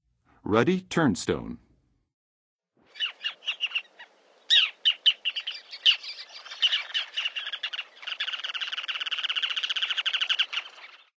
Ruddy Turnstone, Birds of North America; Western USA; Western USA Songbirds

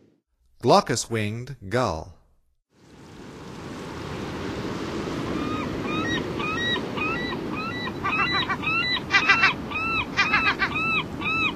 Glaucous-winged Gull; North America; Stokes

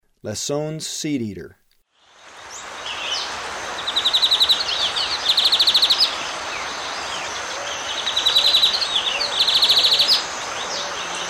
Lesson's Seedeater

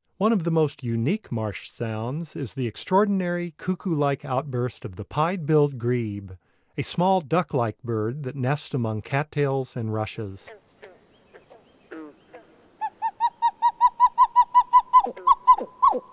Pied-billed Grebe, Bird Sounds; North America; Common Western Species; Know Your Bird Sounds

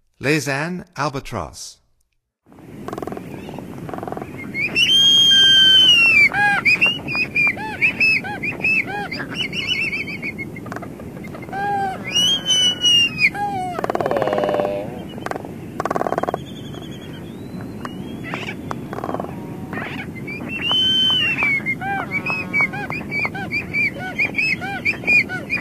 Laysan Albatross, Songs of Birds of North America; Stokes

